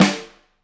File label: room Flam, drum